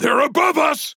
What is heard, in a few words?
Flying; Warrior; Paladins